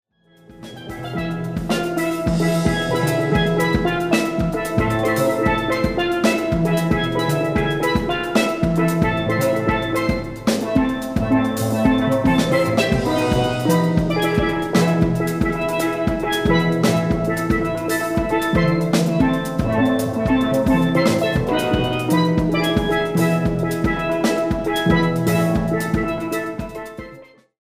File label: panina minor, steel